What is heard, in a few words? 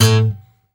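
GUI Ac. A2, acoustic guitar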